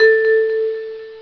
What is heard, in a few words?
vibes, Amiga Soundtracker Sample Packs; ST-XX Amiga Soundtracker Sample Packs